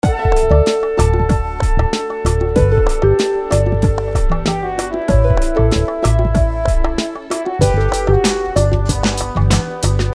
Transition

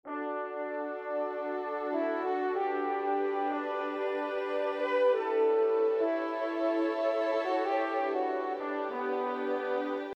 Transition mellow

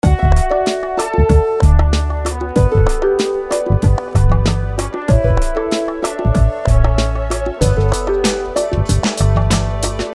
Transition

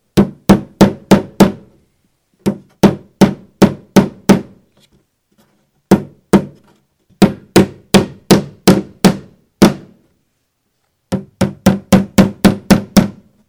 Hammering

